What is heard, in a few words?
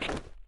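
shuffle, footsteps hard surface jump up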